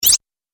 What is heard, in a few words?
Multimedia Rollover, mouseover sound; Andrew Fleming